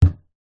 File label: impacts wood wall hit hand thud; zapsplat